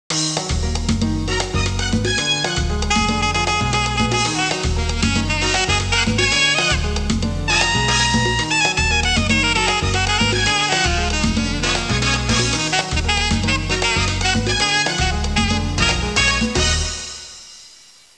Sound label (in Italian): musica latina